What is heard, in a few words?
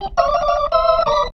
B3 LICK F; B3 PLAYED